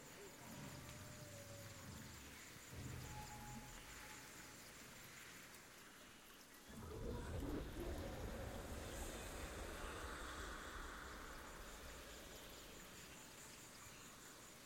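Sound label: ambience ixtal jungle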